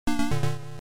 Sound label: Title Beeper; Hydrofool